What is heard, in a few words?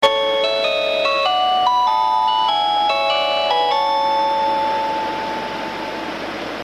train chime; スーパー白鳥; ７８９系のチャイムです。; チャイム１〜４は車掌放送用です。